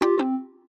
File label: Close, phonetones, LG A250 system